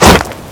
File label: stone pick stone